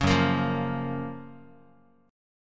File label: F sharp Major 7th, Guitar; F#M7 Chord; strummed; 4,2,1,3,x,x